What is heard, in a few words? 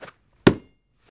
Football Heavy Grab